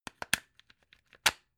plug plug in clatter

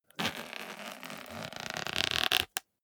zipper small case close slow

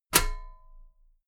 metal ladder step heavy

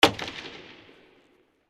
bench board hits

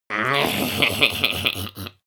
goblin laugh evil

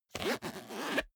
zipper small case open

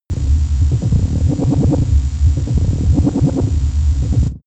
space alien machinery

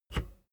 bicycle street brake press

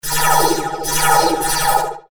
lazer

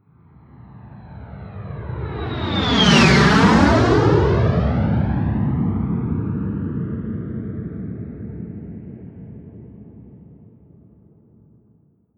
military jet bomber flyby whoosh

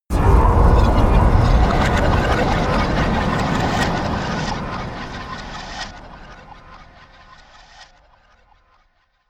hissing metal

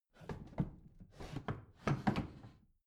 bathroom drawer close rattle

